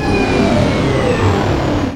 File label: Scifi Power Down Generator; Energy & Forcefields